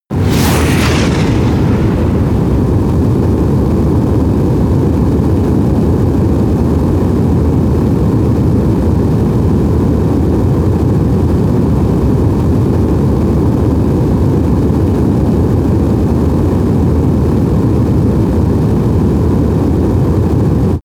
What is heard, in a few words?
spaceshuttle fly whoosh